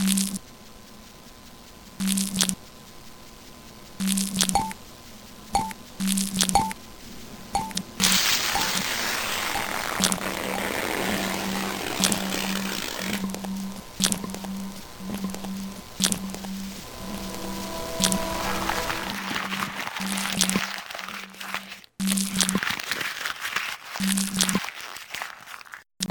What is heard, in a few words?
paper wall